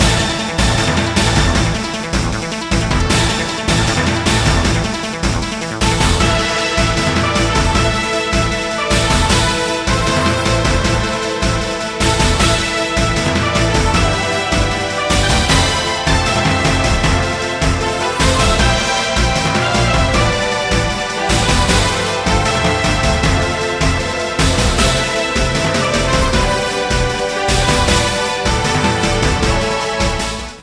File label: ringtone